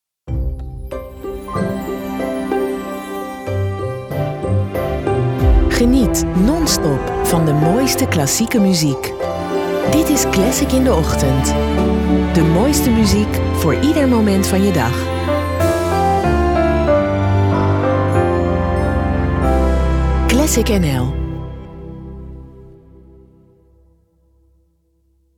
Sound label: classic authentic strings